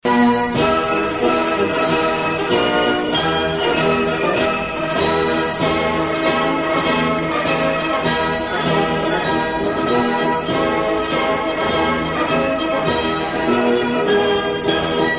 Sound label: GER WAR Hohenfriedberger Marsch